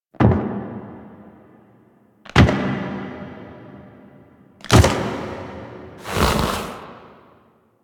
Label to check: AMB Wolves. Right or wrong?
Wrong